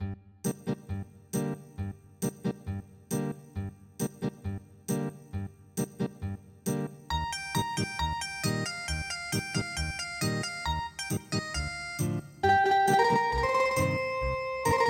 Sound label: Ethnic Guitar, traditional